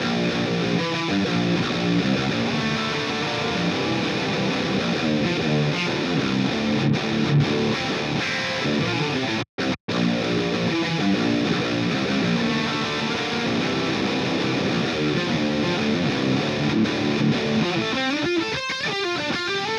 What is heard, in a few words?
Guitar Tube Comp to Amp